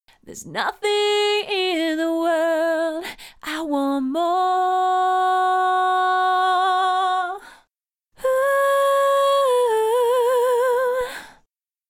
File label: vox - Pitch Correct subtle; cubase5